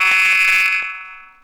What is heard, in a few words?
robot, synths; Notes